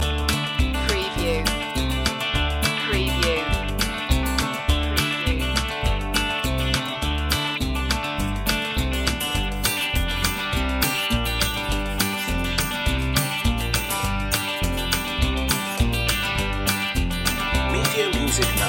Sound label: ozark stomp loop